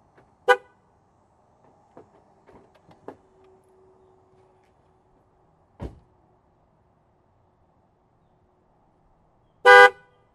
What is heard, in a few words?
ford focus mk3 car horn